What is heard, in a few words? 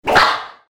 fight kung fu whip swish